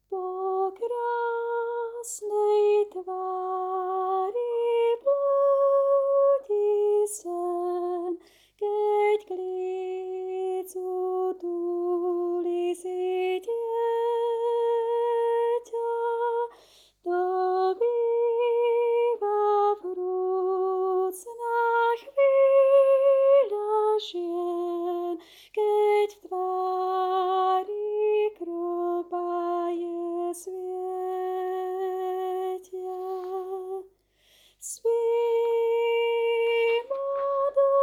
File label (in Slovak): Madona s dietatom Tenor; spevokol